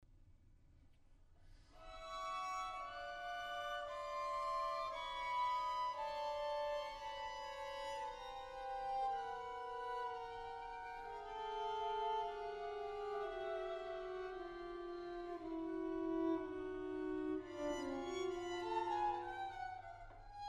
String Quartet; Edward Cowie

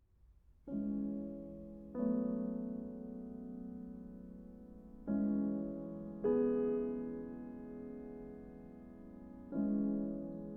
piano; Sunset Sketches III; Zoe Solomon

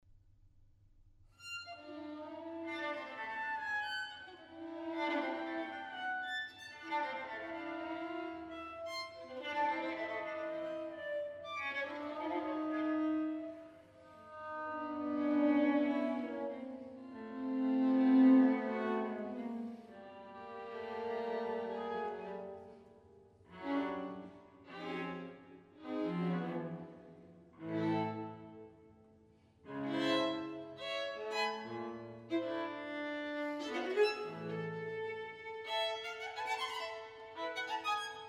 String Quartet; Edward Cowie; 'Birdsong Bagatelles'; Robin

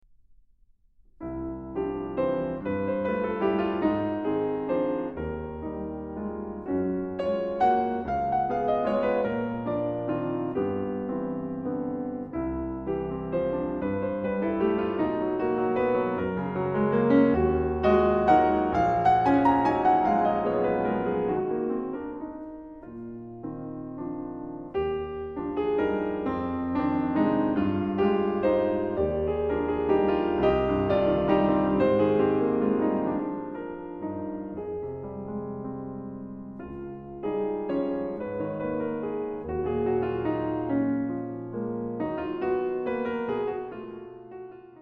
Piano Pieces; 2 Piano Pieces No.1

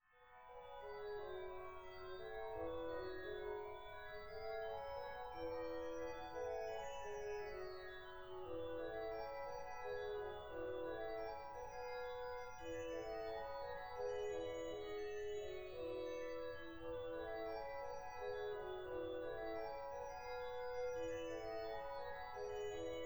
Organ; Hakim; Glenalmond

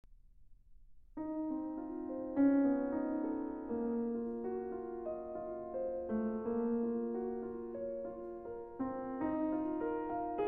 mezzo; Varennes-sous-Dun

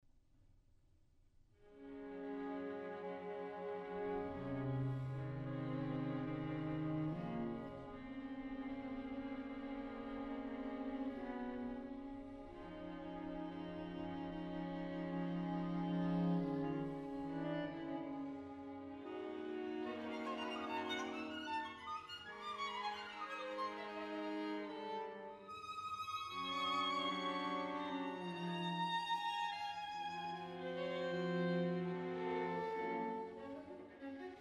String Quartet; Edward Cowie; 'Birdsong Bagatelles'; Green Woodpecker

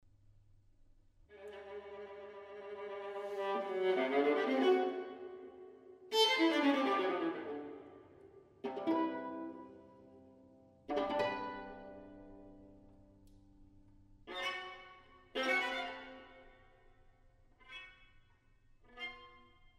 String Quartet; Edward Cowie; 'Birdsong Bagatelles'; Mallard

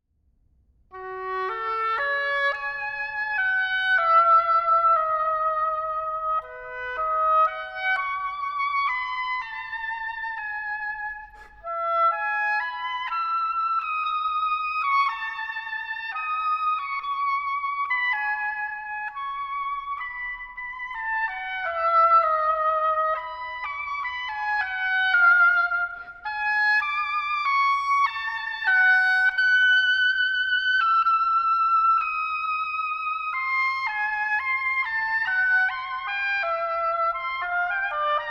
oboe; Cornucopia III; Philip Haworth

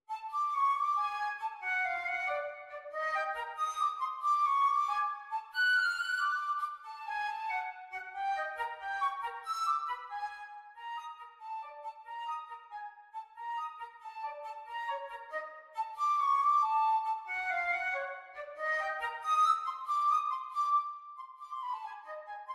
FLUTE AND PIANO

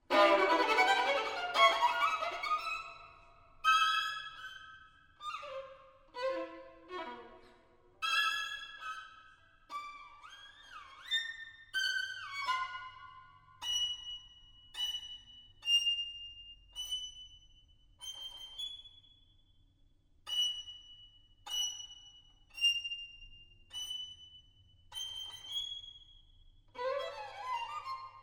String Quartet; Edward Cowie; 'Birdsong Bagatelles'; Blackbird

